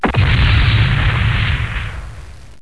explosion; MECH2

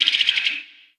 rapt click short

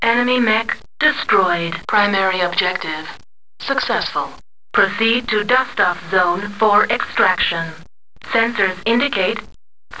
long shutdown; MECH2

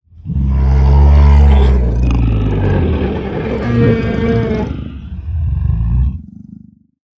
t-rex growl

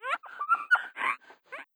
Dilophosaur

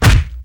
hit hard

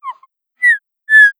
Dilophosaur

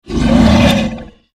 dino pain light

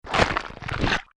dino bite

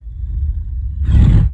trex growl

